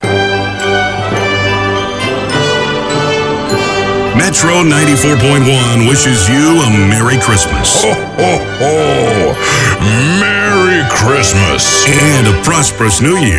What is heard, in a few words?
MERRY CHRISTMAS SONG